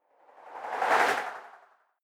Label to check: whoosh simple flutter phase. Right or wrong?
Right